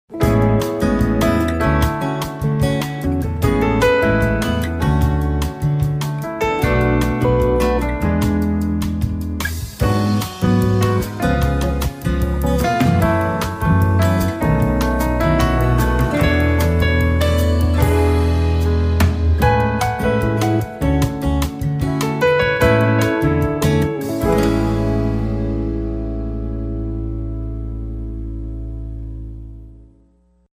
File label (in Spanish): Bossa Nova; honduras musical 9no grado